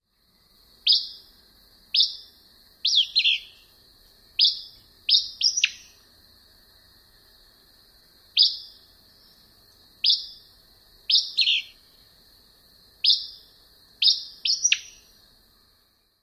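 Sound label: Acadian Flycatcher twilight song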